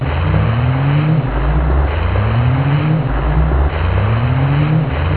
alien alarm; Weird and Interesting Hits; single hits

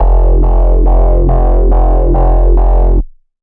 synth bass hard pulse; multisampled; synth keys

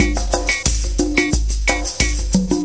090 bpm afro cuban electro, DRUM LOOPS and BREAKS

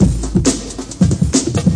136 deep thick roller, drum loops and breaks

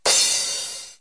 cure crash, drums single hits

